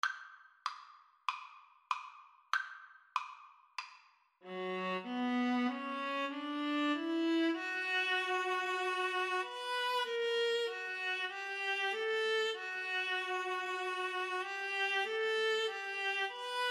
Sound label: el cant del ocells, Viola Cello Duet